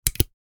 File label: Heavy hole puncher mechanical press